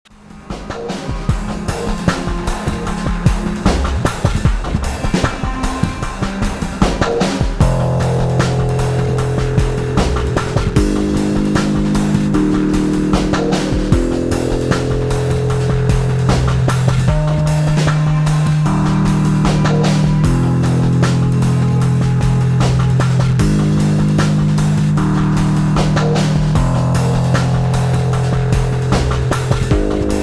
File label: darkjazz ensemble